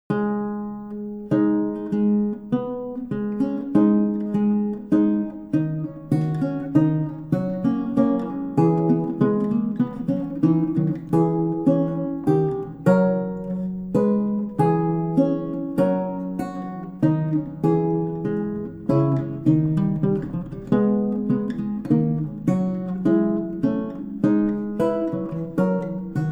lute; conditor alme siderum

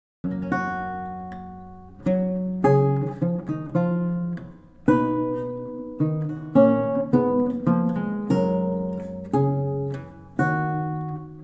lute; o cruz ave

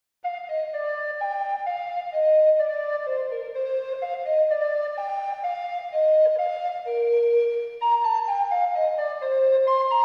prelude; pepusch

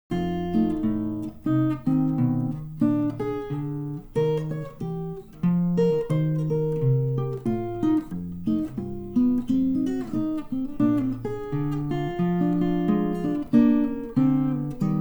blues

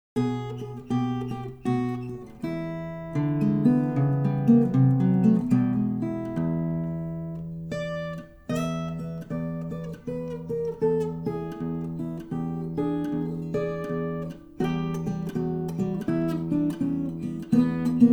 blues

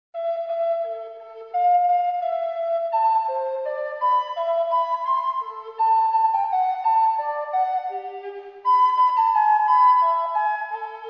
fantasy presto; telemann

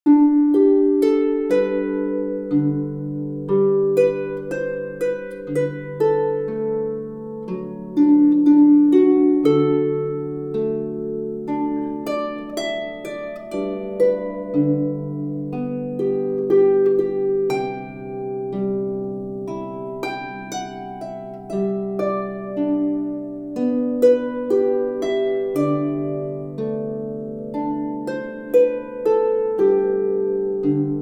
drums; dumbarton's

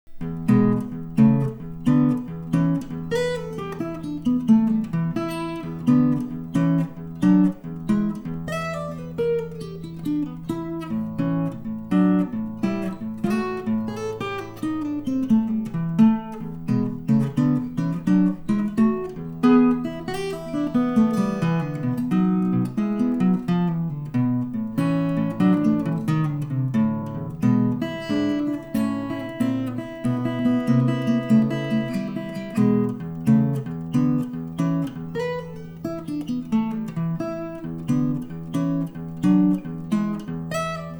double stop blues

